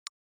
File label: Smartphone UI Sound Dry